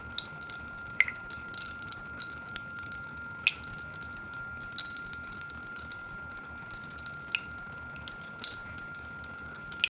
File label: liquid